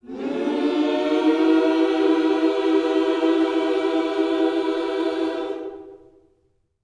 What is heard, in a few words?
MINOR, MIN.SCOOPS, Vocal Planet, Gospel